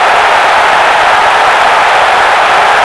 Large Crowd Cheer; David Beckham Soccer; XBOX

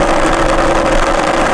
roll rock; terrains; Tony Hawk's Project 8; XBOX

